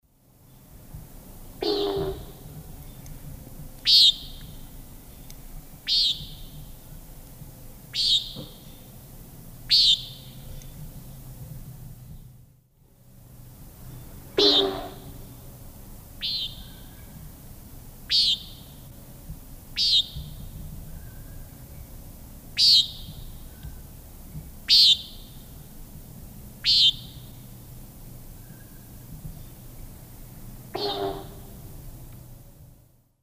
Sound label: Chordeiles minor, calls and wing noise; S. America; Eastern Lowlands